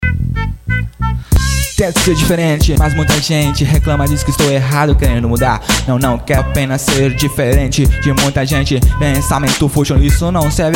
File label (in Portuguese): rapper música